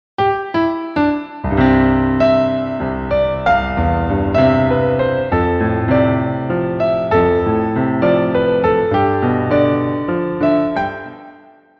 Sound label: Piano Concerto 1; Tchaikovsky